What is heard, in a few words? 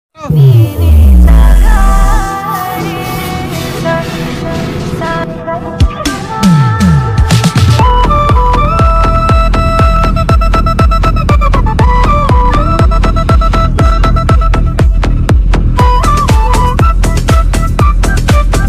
movie bus scene bgm